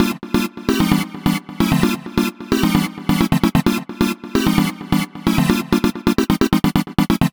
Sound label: Stab 131 G; 90 sSampleCDs; Club Techno